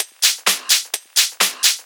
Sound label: Discohouse Loop 128 BPM